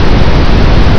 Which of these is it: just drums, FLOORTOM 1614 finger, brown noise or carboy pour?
brown noise